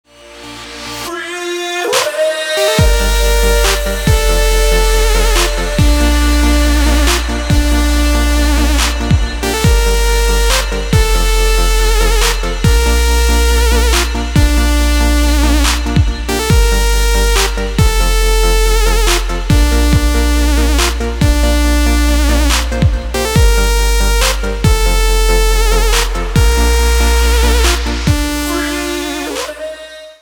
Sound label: dubstep